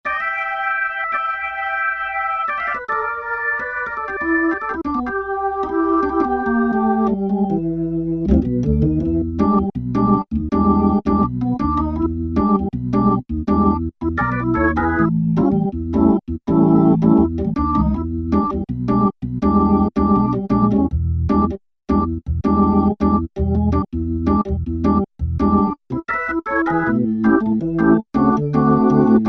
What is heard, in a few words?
B-3 Jazz Chorus; Keyboard Instruments; Roland L-CDX-02 Keyboard Instruments